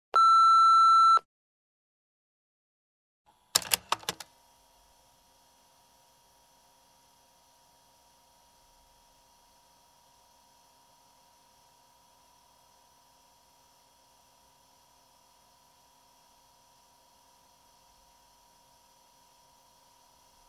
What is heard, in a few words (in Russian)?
telefon